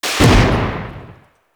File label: Pistol Tail; Guandao